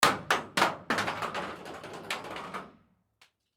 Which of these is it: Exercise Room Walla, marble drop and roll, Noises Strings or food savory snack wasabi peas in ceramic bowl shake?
marble drop and roll